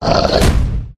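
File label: lizard block; yasher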